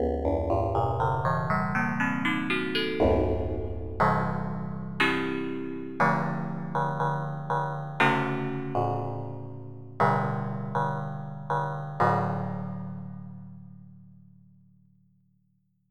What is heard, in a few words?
Ersatz Chimes